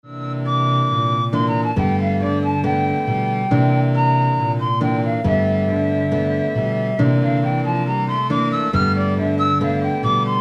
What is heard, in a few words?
Trio